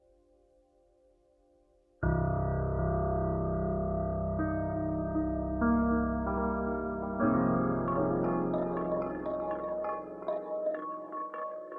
Music Corridor; the swapper

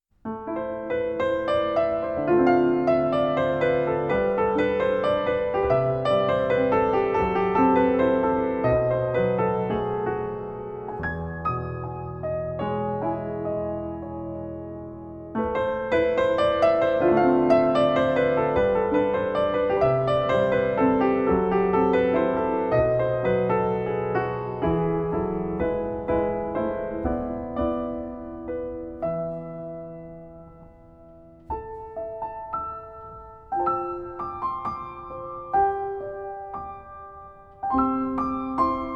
menuet, piano solo; Victorian Romance Emma

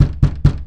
door bang

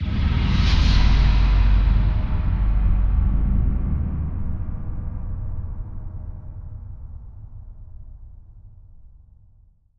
Death; Platoon

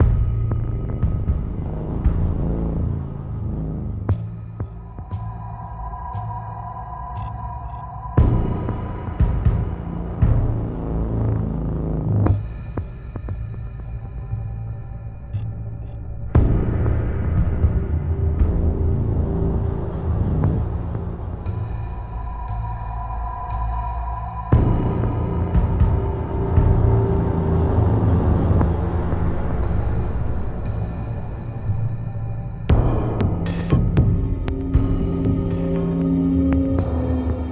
Ambient; Dark Sector

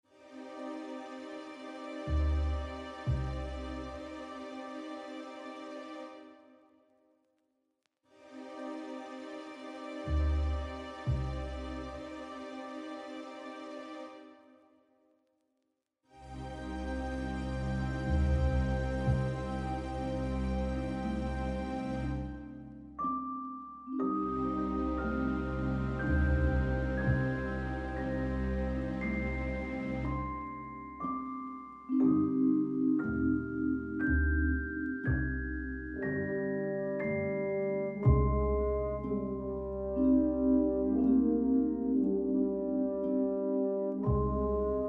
Music Loop 32 Bars; Agent A A Puzzle in Disguise; Windows

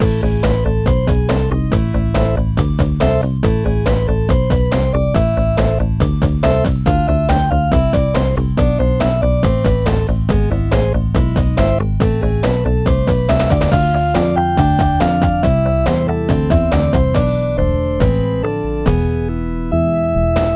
Boss Loop; ravva and the phantom library; Whale Boss Loop

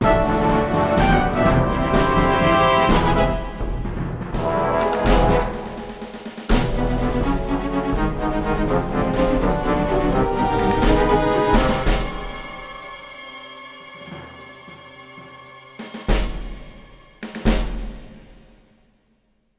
Duel Music Win

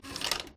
door open

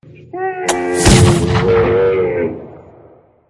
Soldier Camel

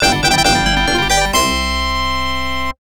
Item Collected; quack shot starring donald duck genesis

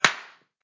Slap; talking tom cat 2 android ios online gamerip 2011

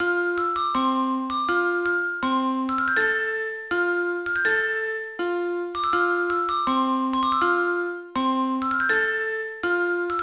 Music Box; Imagine Babyz Fashion; NDS

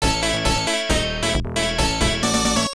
Unknown Jingle

